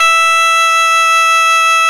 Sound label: E 2, schluetter; SoundBytes - Early Patches